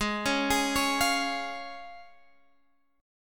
Db Major Ab

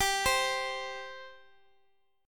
C 5th G

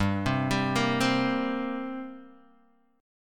G Minor Double Flat 5th, G, Guitar, Open D

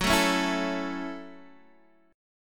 Gb Minor 7th Gb, Guitar Open D

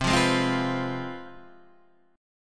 C Major 7th Suspended 4th Sharp 5th, C, chord; CM7sus4#5 Chord {8 8 6 5 6 7} strummed

